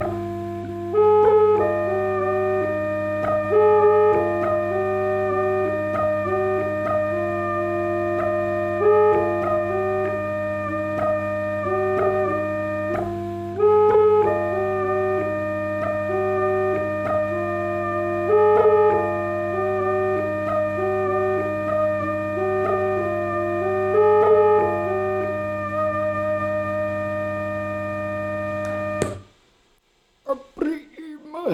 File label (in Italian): corno voce